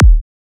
Kick, D

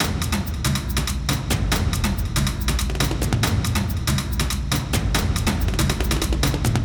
Cinematic Drums Loop

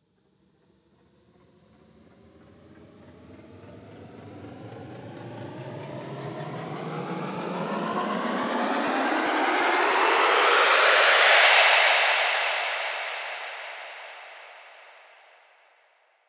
Uplifter

